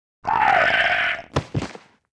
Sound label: Lizard war die